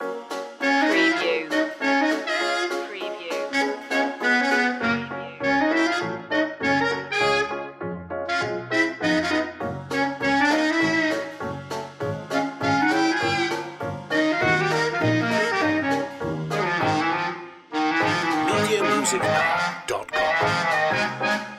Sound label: bugsy bop loop